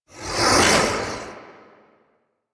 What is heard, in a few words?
Whip Ranged Attack Projectile; Warframe; The New War; Archon; Nira